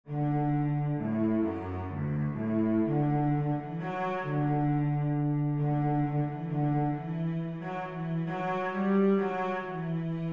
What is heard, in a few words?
minuet cello melody; fireworks